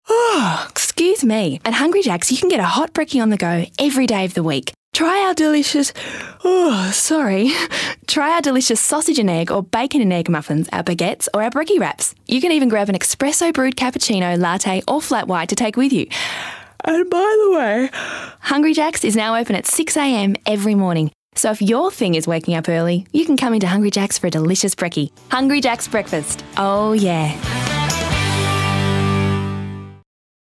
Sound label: Yawn